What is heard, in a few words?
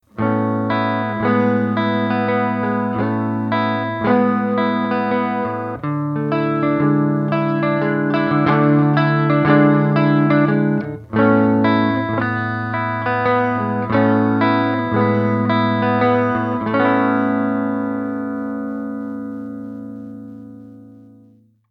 Neck Clean